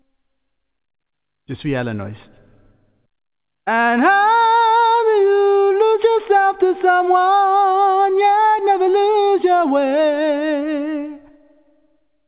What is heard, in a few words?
vocal